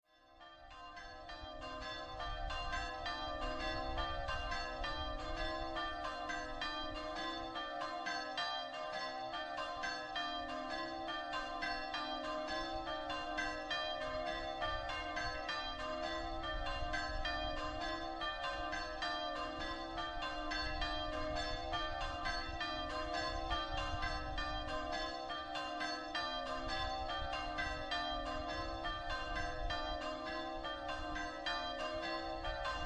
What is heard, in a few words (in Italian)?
PORTO NOGARO, campane